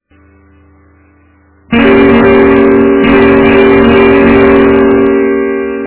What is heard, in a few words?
d chord example